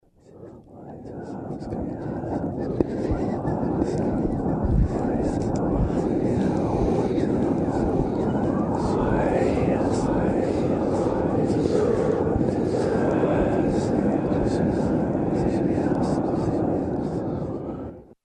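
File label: very scary sounds and screams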